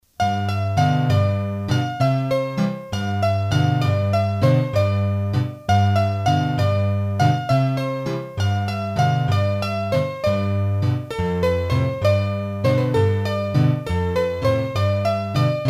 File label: Ai Vist Lou Loup, Bourees d'Auvergne, hurdy-gurdy